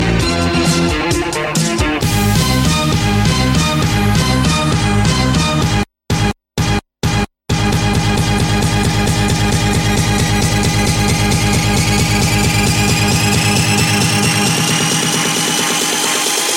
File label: club dj ringtone